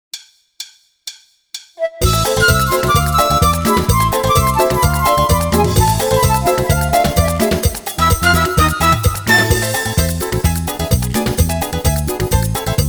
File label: Tropical Merengue